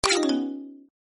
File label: fold